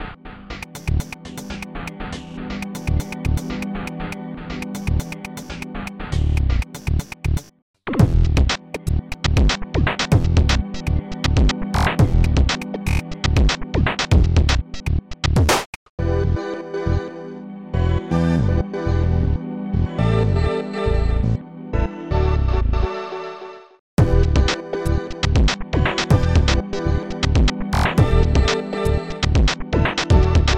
boot music; Protracker Module; Protracker M.K.

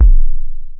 KICK; 909; 808+909

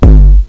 tr 808 overdrive, bdrum samples

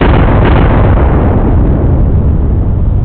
Missile Hit Far; Kongbots 1.0; Robots; Strategy

